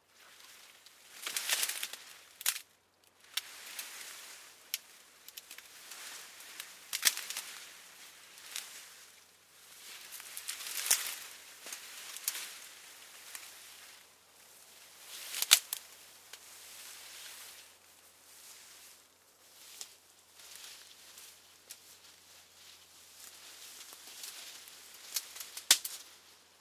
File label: Leaves Moves Feet Snaps; Objects; Big Room Sound